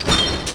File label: step; kingpommes; starwars; atrt